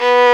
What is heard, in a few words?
STR VLN, Str Quartet, Strings